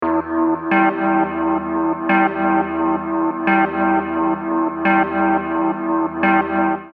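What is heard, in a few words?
174 atmos, Dark and looming; Tech Flavours; Drum & Bass Sample Pack inspired by the sub-genre of Tech Step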